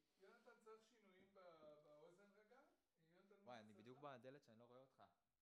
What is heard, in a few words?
TB GTR